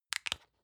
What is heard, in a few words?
lotion lid open medium; berufe; friseur